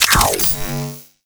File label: Glaive Equip; Crp Glaive